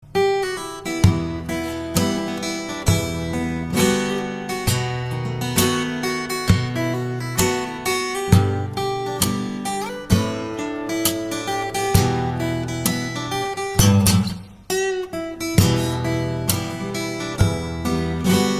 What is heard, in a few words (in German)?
Gitarre